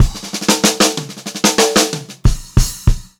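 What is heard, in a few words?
STRAIGHT FILL, Funk-Groove Drumloops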